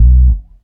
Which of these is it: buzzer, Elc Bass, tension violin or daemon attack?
Elc Bass